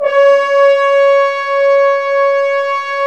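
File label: French Horns sfz; Roland LCDP06 Brass Sections; F.Horns 2 f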